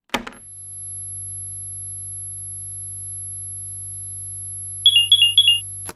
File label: charging booster; Zomboid; DIY VehicleParts